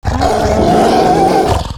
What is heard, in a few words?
Howlfiend Play